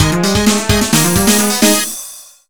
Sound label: BGM Battle End; Sega Battle End; Irodzuki Tingle no Koi no Balloon Trip; NDS